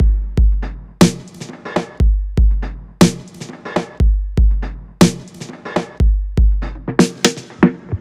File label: Snare Kit, Electro Dub